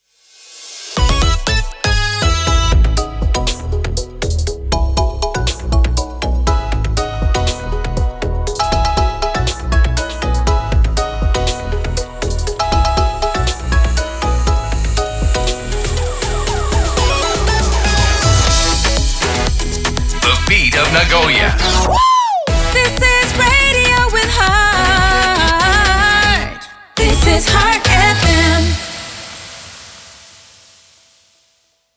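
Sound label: Sung Jingles E major 120bpm RAMP; 79.5 Heart FM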